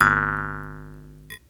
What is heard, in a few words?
JEWS HARP; Jew's Harp & Spoons